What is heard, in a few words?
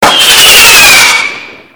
firework